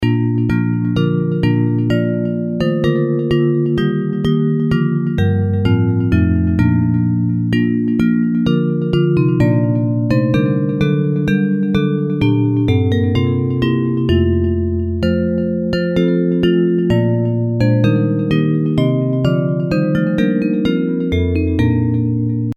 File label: Bells